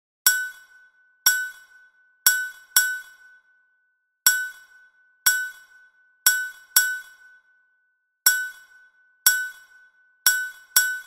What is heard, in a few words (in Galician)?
Crotalos